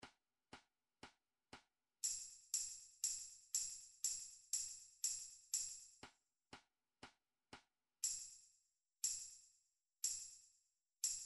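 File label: tambourine; rumtrax library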